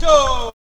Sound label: VOCALS; Ueberschall; Jam Box